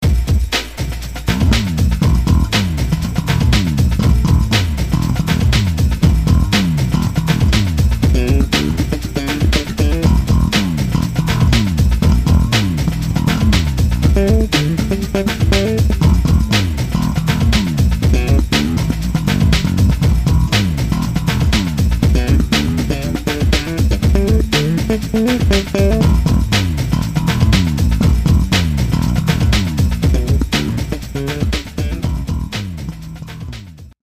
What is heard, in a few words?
hiphop